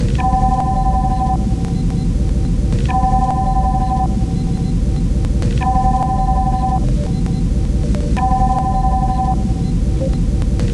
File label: red alert